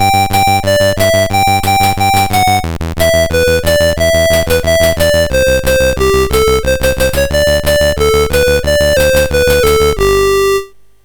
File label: Blues, MOS6581R4